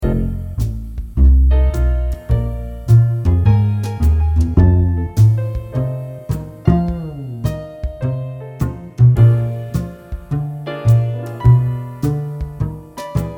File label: Upright Gut String